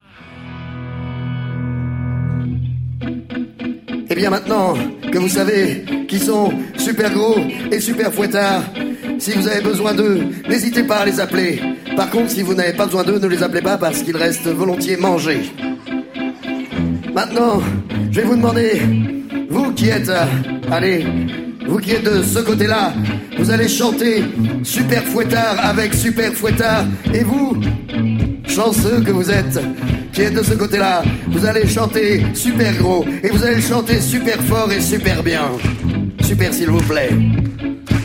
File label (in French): LA SUPER CHANSON live